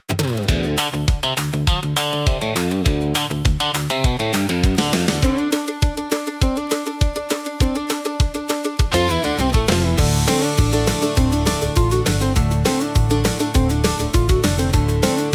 Dust on the Gravel Road, country acoustic for nature and refreshing